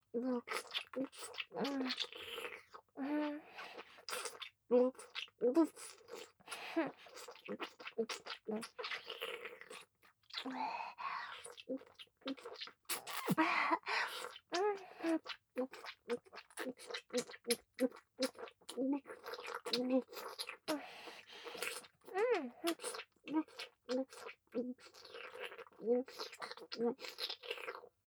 フェラ・激しく

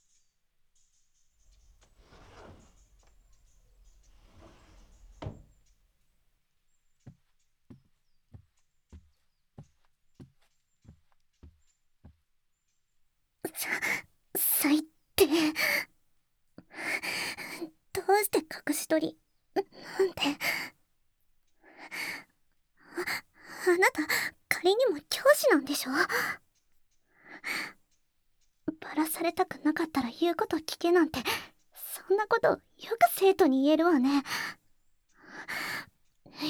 ベロチュー、オナホ手コキ; 脅迫; ツンデレJ●クラス委員長

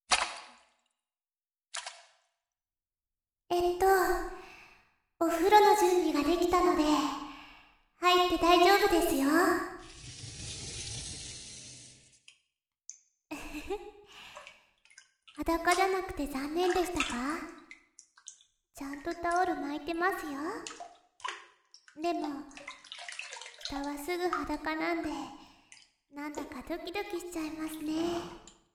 お風呂癒しのマッサージ＆耳舐め, ASMR; 添い寝メイドカノジョ

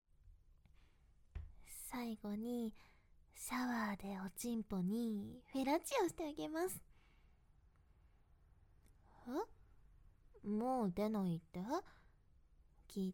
シャワーしながらフェラチオ; デリヘル呼んだら生意気でちょっぴりSな後輩が来た～知り合いと初めての中出し本番えっち～

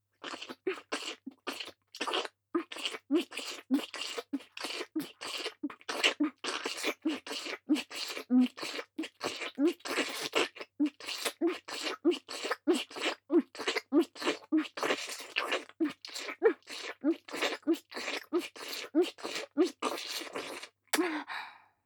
咥え込み 激しいフェラ; ハナ

